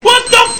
nukebomb

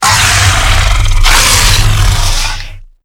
baron roar, baron of hell; doom4

